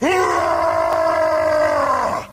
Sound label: charge orc, Voices Emotes